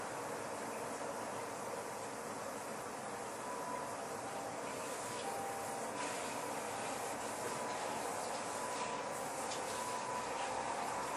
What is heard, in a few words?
bath siren